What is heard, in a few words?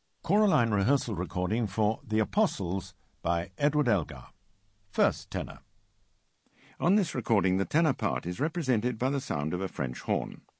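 Apostles Tenor 1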